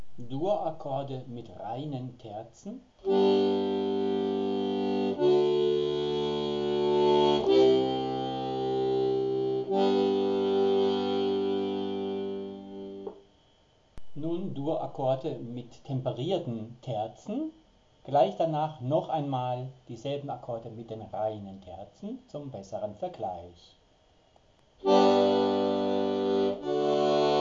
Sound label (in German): Diatonische Dur Akkorde Mit Reinen Terzen, akkordeon